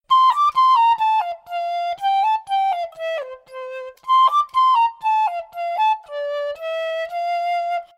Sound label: flute